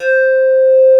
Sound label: BASSVOX; Masterbits; Vintage Voltage; SQ+FX